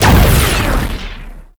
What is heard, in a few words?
temple shred explosion; Warframe